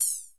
Hi Hat Closed Phaser; Electric Empire

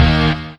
E Guitar Heavy; Eitabyte; pitchglitch

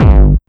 Kick Dist Hard 909; Djdomix320

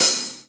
HiHat Open

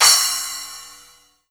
Crash Splash Death Metal; pitchglitch

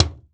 Tom Low Wooden Junk; Theminkman

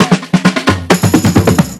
Tom Roll n Break; Drum Digger

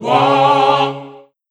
Vocal Choir Wahhh

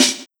Snare Jungle

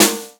Snare Jungle; K27Trash; pitchglitch

